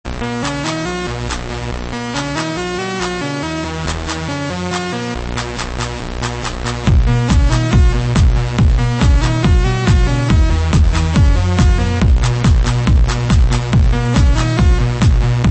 ringtone; iphone 4s

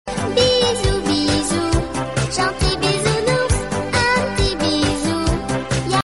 french cute ringtone; iphone 8 plus

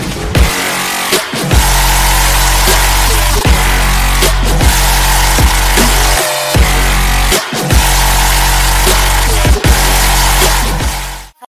i phone x ringtone

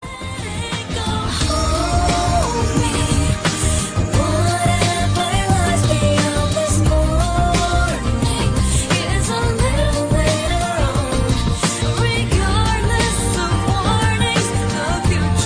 remix ringtone; iphone 6s

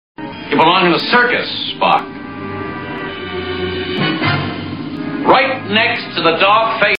ringtone; oppo 2018

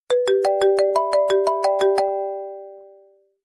marimbas ringtone; iphone 7 plus

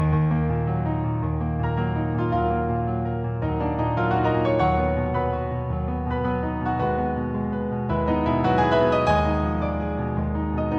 i phone x piano spring ringtone

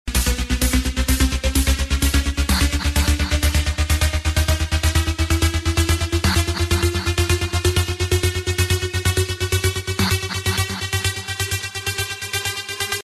heavy trance iphone 6s plus ringtone

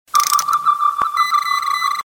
oppo smart phone xs ringtone

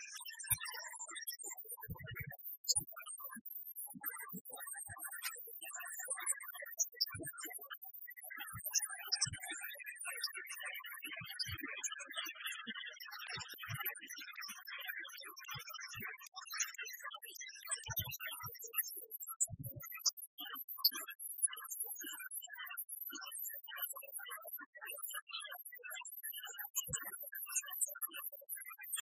uplifting iphone 5 cs ringtone

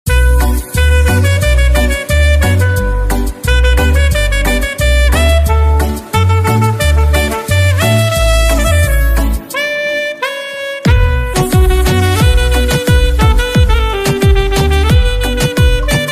saxophone i phone 3g s ringtone

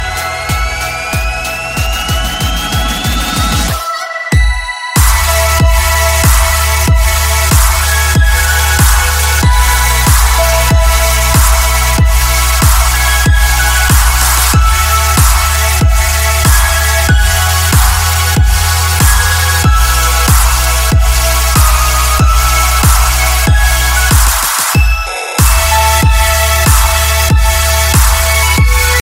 i phone x plus space ringtone

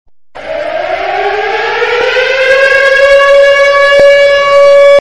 air raid siren alert sound

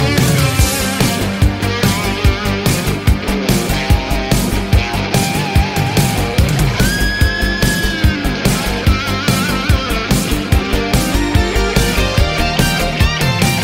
i phone 6 plus rock version ringtone

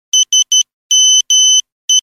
nokia special beep

